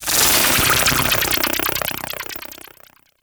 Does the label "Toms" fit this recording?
No